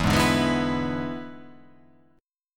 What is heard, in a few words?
E 7th E down